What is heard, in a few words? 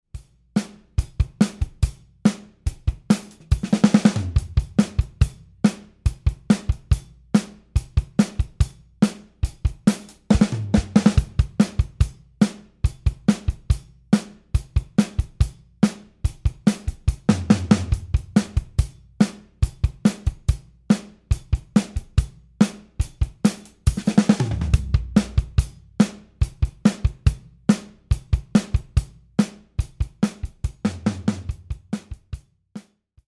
80s pop